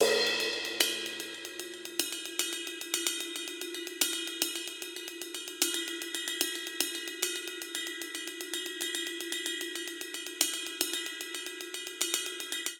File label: Triplet Hip Hop Cymbals Only 75 bpm; 4 4; MIDI Clips; Ableton; Factory Packs; Drum Booth